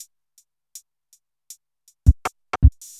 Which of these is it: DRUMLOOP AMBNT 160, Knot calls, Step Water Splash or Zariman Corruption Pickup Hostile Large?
DRUMLOOP AMBNT 160